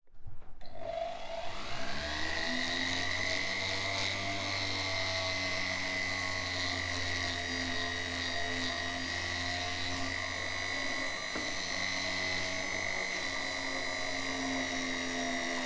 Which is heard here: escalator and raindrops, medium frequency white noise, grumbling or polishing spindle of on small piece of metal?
polishing spindle of on small piece of metal